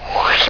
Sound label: Zoom Up; Patton Strikes Back